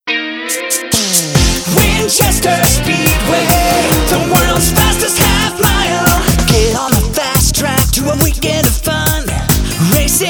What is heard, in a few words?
Speedway, sports shop jingles; Winchester Speedway